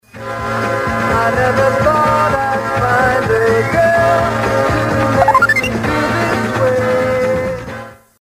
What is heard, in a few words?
tone; June 30, 1968; electronic tone to notify listeners to stop calling for a contest